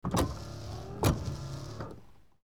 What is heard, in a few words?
cadillac windshield wipers single wipe